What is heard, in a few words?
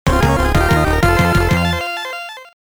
Level Complete; Turrican Anthology Original Sound Version Vol. 1